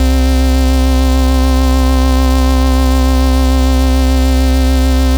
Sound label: Basses C; Vengeance Samples